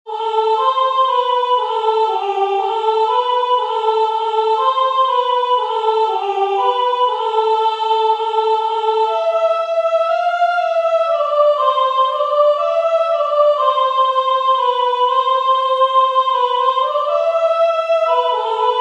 soprano; En gardant ma bergerie

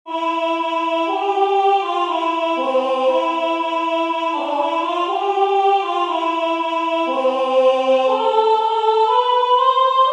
alto; En gardant ma bergerie

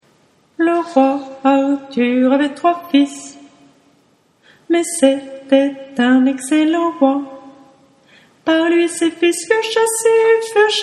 alto; Le roi Arthur

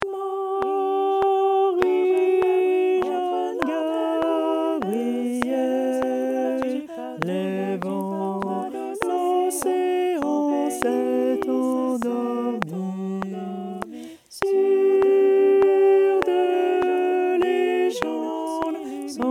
Basses

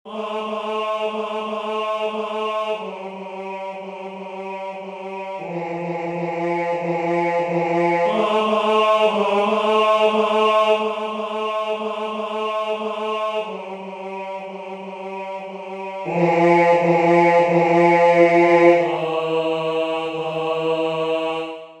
tenor; Nada te turbe

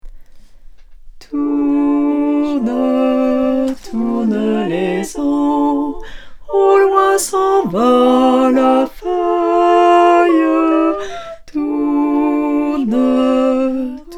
Basses

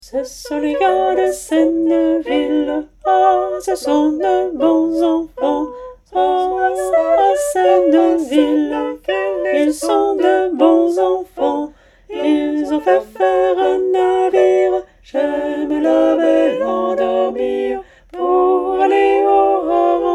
Alto; Les gars de Senneville

